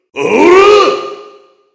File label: heavy meleeing